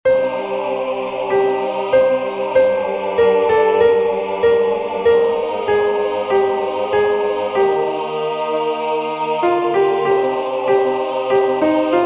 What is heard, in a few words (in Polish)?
Sopran; Dzieki Ci Panie